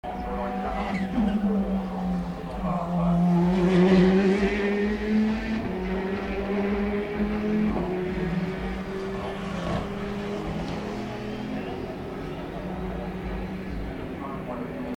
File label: Porsche 911 after Ford Chicane Thursday qual